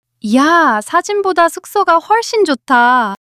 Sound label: surprise